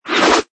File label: Archery: Bow and arrow, arrow swoosh by; Bows and Arrows; Combat; Arrow Whoosh